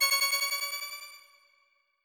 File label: win open